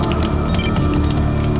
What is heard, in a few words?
beep